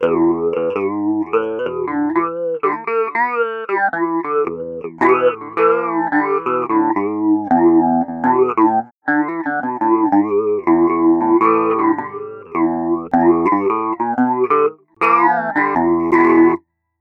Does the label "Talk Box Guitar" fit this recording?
Yes